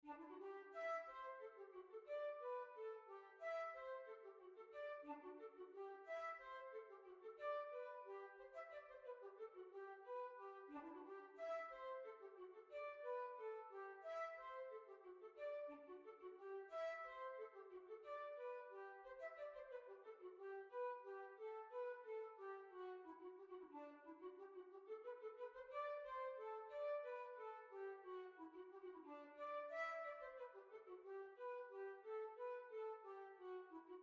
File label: Hornpipe; Staffordshire Hornpipe